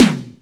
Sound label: drum, drumcomputer, EMU SP 12